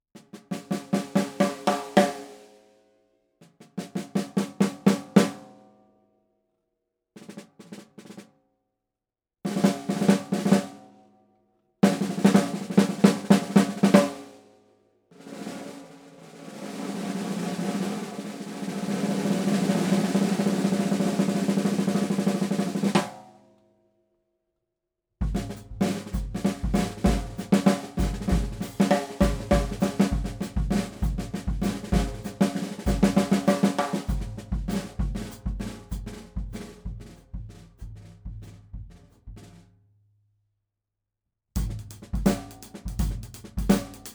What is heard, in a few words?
Ludwig Standard